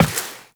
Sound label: Step Glass, Footsteps Walk; Warframe; Characters; Tenno